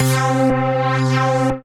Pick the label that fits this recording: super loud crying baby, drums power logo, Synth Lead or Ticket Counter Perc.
Synth Lead